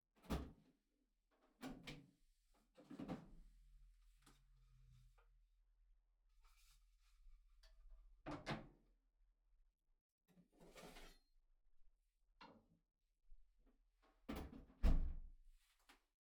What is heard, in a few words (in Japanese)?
まな板 を 出す, 食事に関する音; KU100